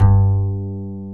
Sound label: Acoustic Bass